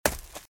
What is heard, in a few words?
Footsteps - Leaves - Run - Left; RadwinIsland